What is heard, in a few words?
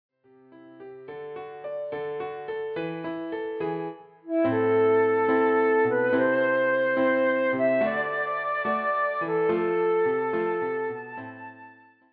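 The House of the Rising Sun, flute; Klavierbegleitung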